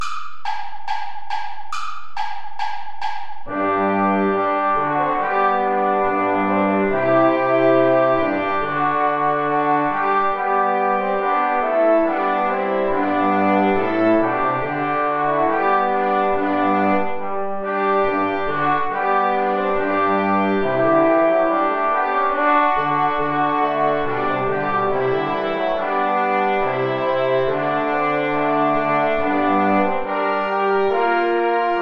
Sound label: ballet play along without trumpet; praetorius michael